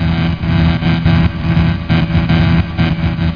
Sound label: lamp; CHASM